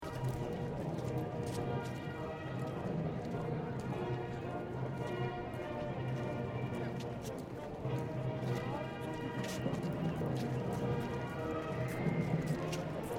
City Horseparade Demonstration, Pomp and ceremony music played by marching band.; Occasional voices in Swedish. Horse parade walking by on tarmac, followed; City Sound Effects